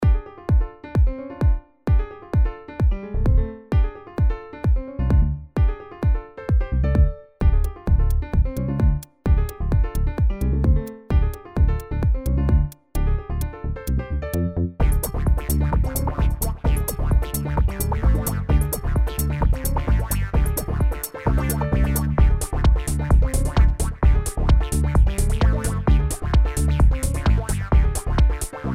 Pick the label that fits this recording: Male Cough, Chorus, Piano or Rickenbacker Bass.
Piano